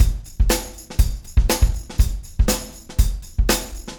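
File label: 120 beat; Big Fish Audio - DOM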